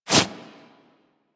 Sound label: Quick Foley; characters; generic foley; Warframe